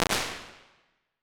1.5s Cellar, Impulse Responses; Medium Spaces; Indoor Spaces; Apple

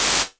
Micro Gate; Small Spaces; Apple

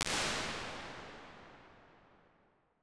Cathedral; Large Spaces; Apple

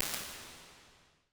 1.4s Concert Hall, Impulse Responses; Medium Spaces; Indoor Spaces; Apple

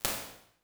1.0s Tall and Small, Impulse Responses; Small Spaces; Indoor Spaces; Apple

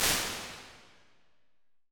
Smaller Brite, Impulse Responses; Medium Spaces; Apple

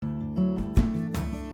wand Trans

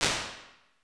Refuse Room, Impulse Responses; Small Spaces; Indoor Spaces; Apple

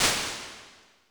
Court Room, Impulse Responses; Medium Spaces; Indoor Spaces; Apple

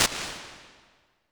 Diffusion Hall, Impulse Responses; Medium Spaces; Halls; Apple

